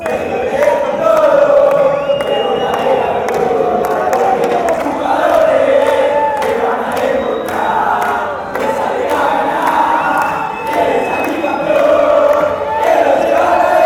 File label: almirante chant